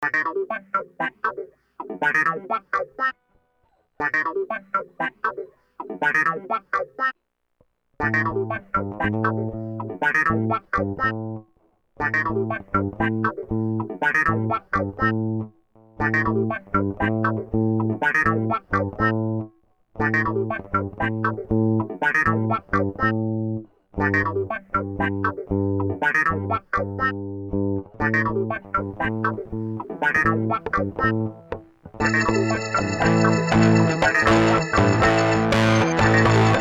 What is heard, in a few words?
funk